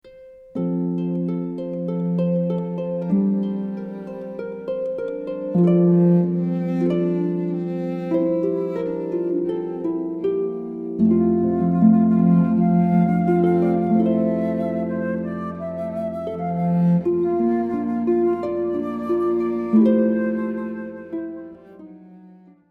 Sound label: harp flute cello